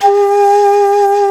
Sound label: shakuhachi G2; InVision Interactive; Lightware VOL-1; Instruments & Percussions